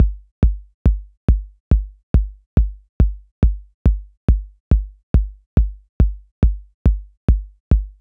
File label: beats; простенькая последовательность битов и шума; "убийца" режима VBR (в частности для AAC кодеров)